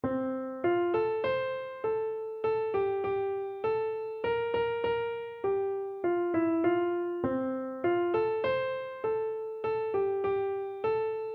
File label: my hope is built, keyboard